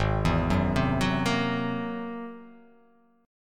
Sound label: G sharp Augmented 9th G sharp